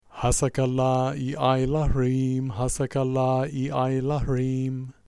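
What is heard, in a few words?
Lalita Kubera mantra, Sanskrit Mantras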